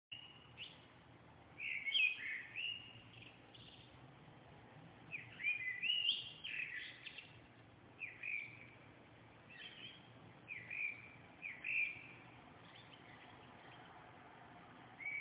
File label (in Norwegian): fuglesang